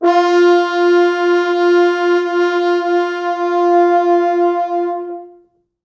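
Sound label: 4 FrHorns SUS, French Horns; East West; Quantum Leap Horns Sax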